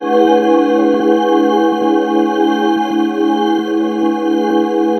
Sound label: shine